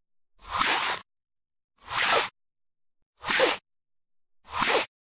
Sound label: Generic Slide; boom library; The Interface